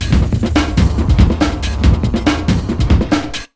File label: RUN 140, Big Beat Drumloops